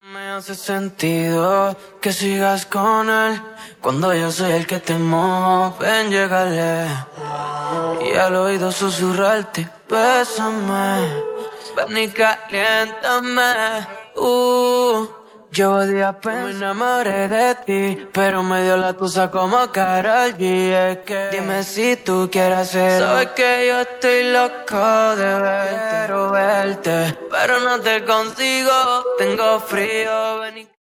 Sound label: Acapella